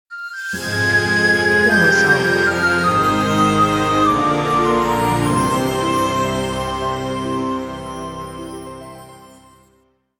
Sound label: Magic Soft Happy Ending